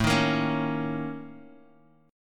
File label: Bb Minor Major 7th Double Flat 5th